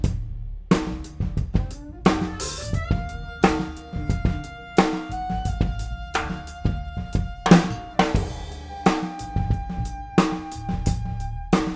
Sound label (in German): Drum Summe Clean 12dB